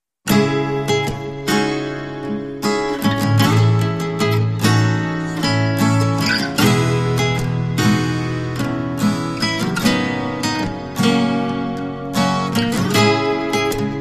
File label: Guitars